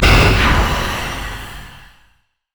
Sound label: morgana q dark binding; LoL